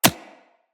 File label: Bullet Impact Generic